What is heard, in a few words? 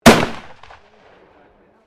RIFLE MOSIN NAGANT 9130 FIRING; Rifle 1930; Rifles, military; Guns